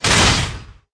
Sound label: Iron; kid; battle